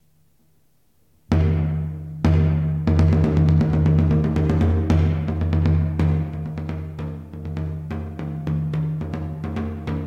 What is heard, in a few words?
folk drum